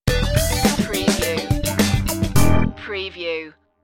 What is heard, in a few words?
do it funky stinger